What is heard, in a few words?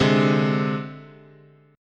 C Suspended 2nd Sharp 5th, guitar chord